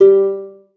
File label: lyre